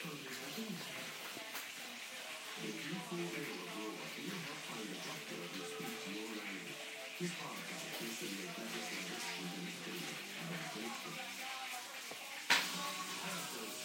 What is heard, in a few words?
evening soundscape at home